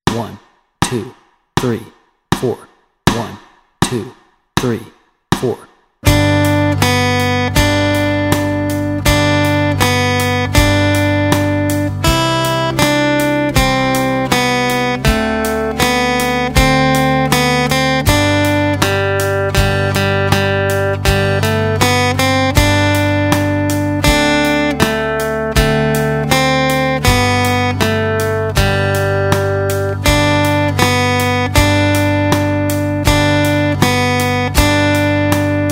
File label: This Old Man Melody and Chords Track, guitar